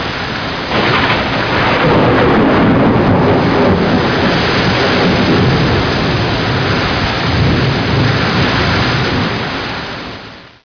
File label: thunder